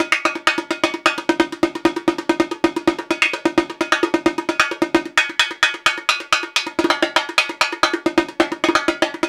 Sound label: 130 bpm, Rio batucada